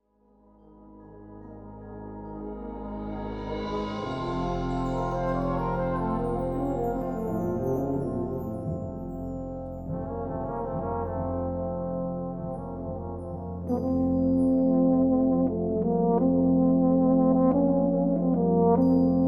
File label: concertino for euphonium